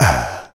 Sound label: Voice Snare; Roland BK-5